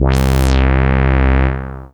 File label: Casio MT-600 Symphonic Ens C2